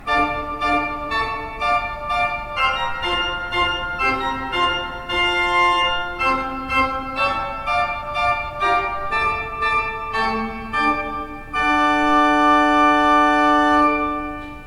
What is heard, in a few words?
pipeorgans